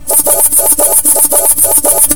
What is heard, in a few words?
Scanner; L'Effet Pommier - Volume 04